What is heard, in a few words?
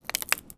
Foley